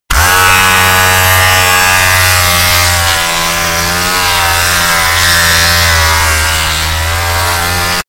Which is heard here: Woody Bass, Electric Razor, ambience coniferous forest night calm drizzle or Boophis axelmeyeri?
Electric Razor